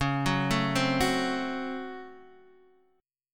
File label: C sharp Major 7th Suspended 4th Sharp 5th C sharp Guitar Standard; C#M7sus4#5 arpeggiated; JGuitar